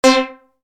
Steeldrums

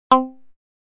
Jaw, synth lead

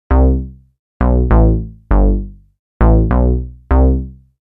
Plasma-Bass piece

